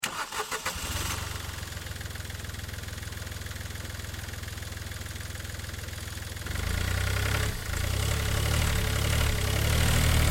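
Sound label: Car Smart Car Onboard Reverse; 2005 Smartcar Fortwo; Vehicles; Big Room Sound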